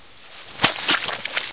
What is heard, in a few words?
barnacle tongue